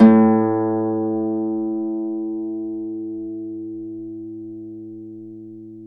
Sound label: Celtic Harp, Plucked Strings